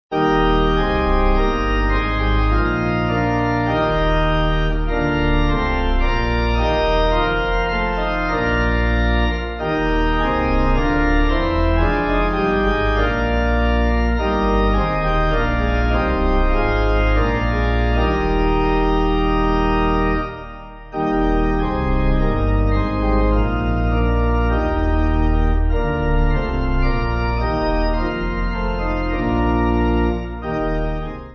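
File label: Eb Pipe, pipe organ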